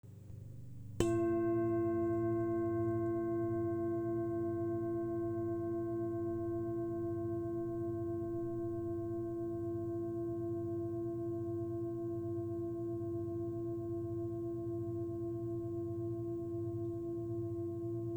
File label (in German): Doppeldorje